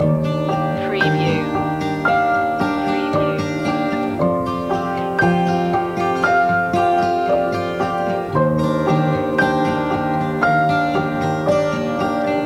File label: raindrops loop, Romantic Nostalgic / Thoughtful